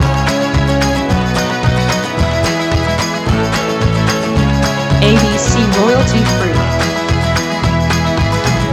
Celtic Folk loop Lo-Fi

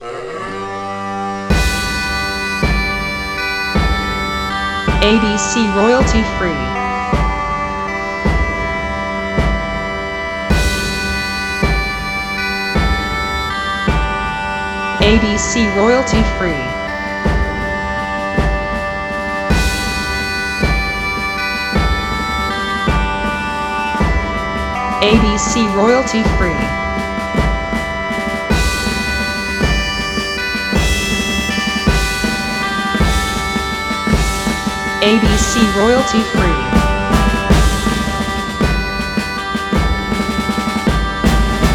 Celtic Irish March bagipes and drums

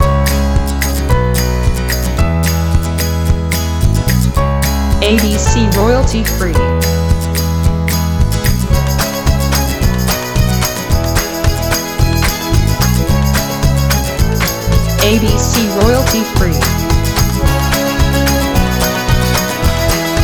Celtic folk

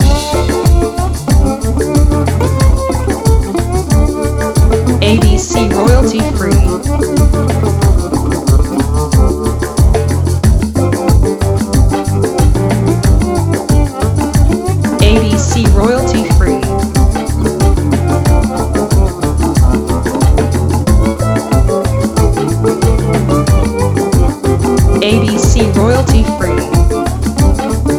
Slide Groove loop

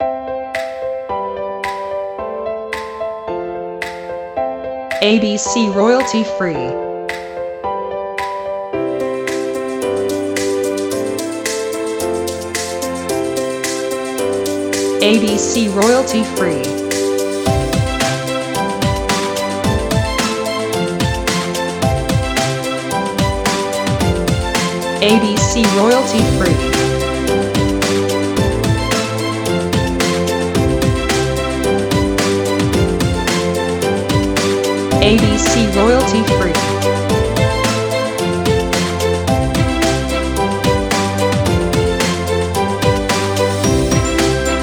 Happy Upbeat Pop Piano no voice

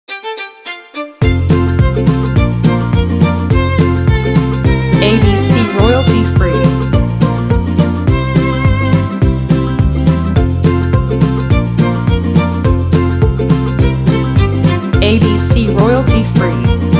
Cajun dance

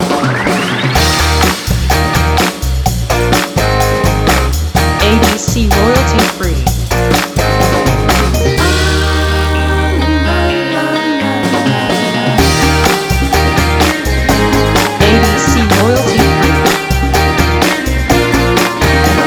Upbeat Indie Pop Rock

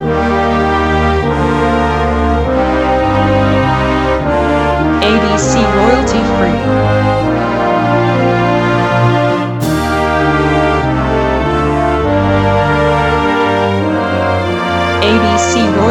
Canada anthem

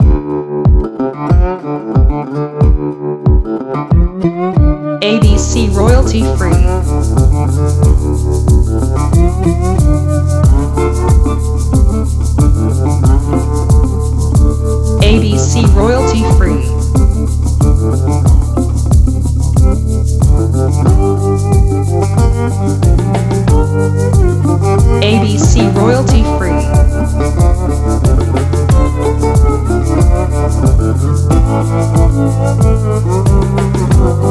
slide groove

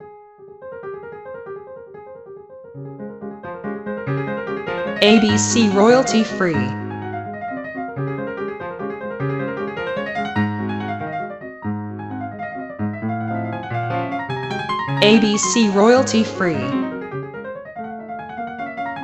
Waltz in D flat; Op 64 No 1; Minute

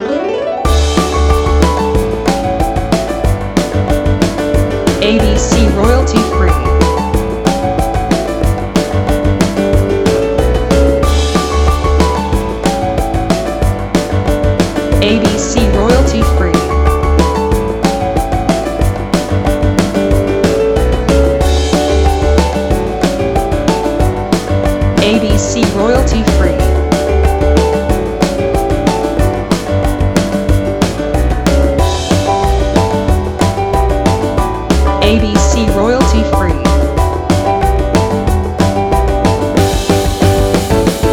Happy Piano Sitcom

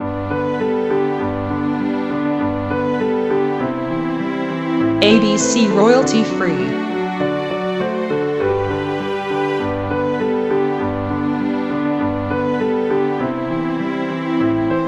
Jingle Bells Piano

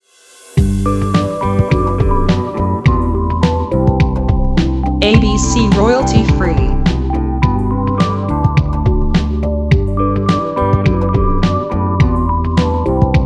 Commercial Background Shorter with Guitar

